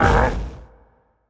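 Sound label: Wind Guardian damage up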